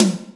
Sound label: Toms, Real